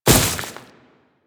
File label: Step Veg, Footsteps; Warframe; Grineer